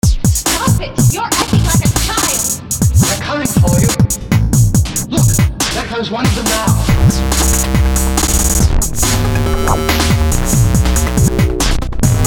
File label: filthy bass idm ish